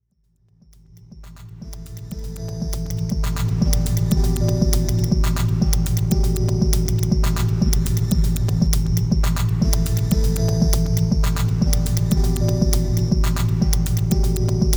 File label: Champa Saw